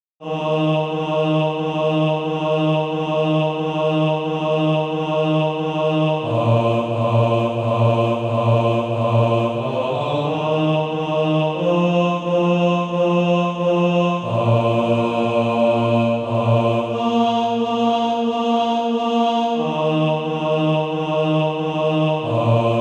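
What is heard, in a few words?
bass, SATB Practise Music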